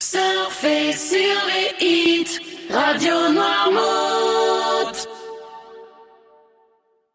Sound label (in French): Jingle Chante acap; Radio Noirmout